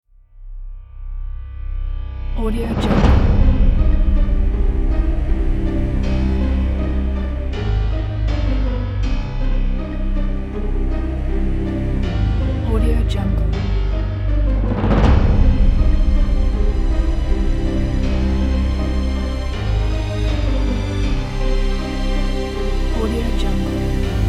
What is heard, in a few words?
halloween